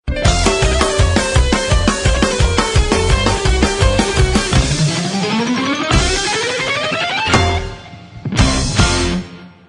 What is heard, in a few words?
Clown Tone